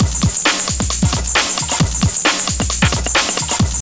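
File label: drum loop; X-Static Goldmine 3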